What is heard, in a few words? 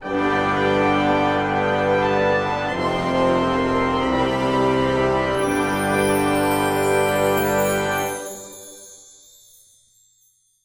mystic cave victory